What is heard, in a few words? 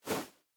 Clothes; Smite; Susano